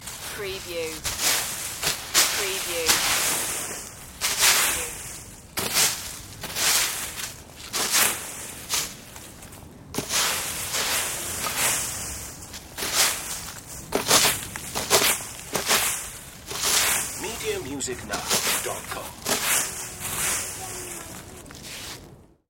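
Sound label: Raking Leaves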